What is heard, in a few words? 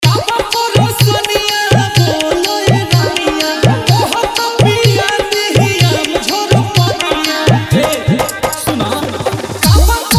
New Bhojpuri Dj Remix Song